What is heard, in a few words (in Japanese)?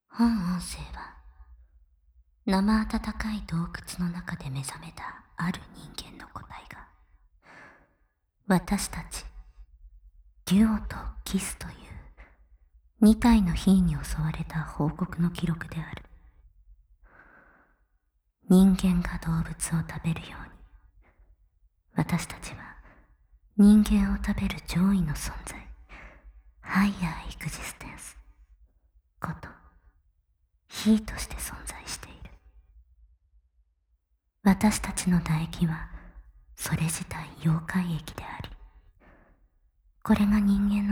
KISS, ASMR